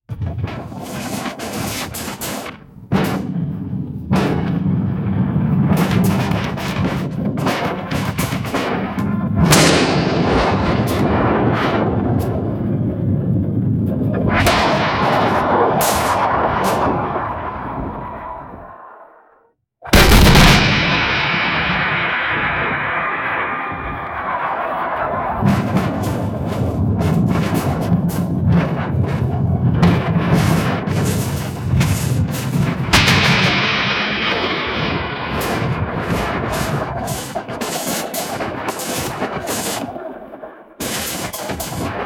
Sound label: a jet aircraft flies over antiaircraft fire the plane drops a bomb. loud explosion. drums